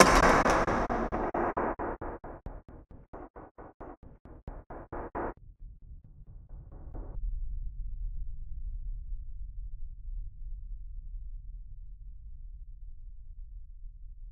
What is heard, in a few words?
Breaking Down Slowly; Cinematic Metal Impacts